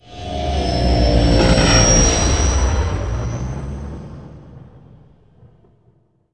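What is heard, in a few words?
item hyperspace exit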